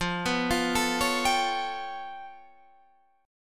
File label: G 9th Flat 5th F